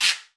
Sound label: Guiro Wood; Club-50 - Foundations Roland